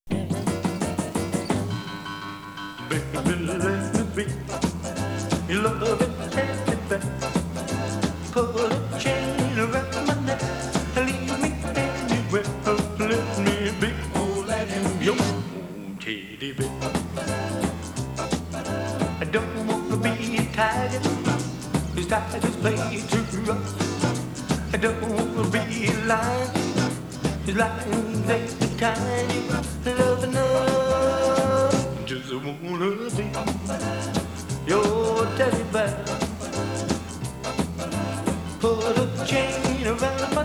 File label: Teddy Bear Singing; Teddy Bear KG